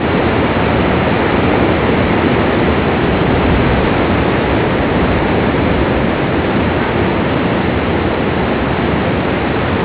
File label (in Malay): air terjun